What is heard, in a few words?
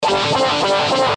Nasty Gtr D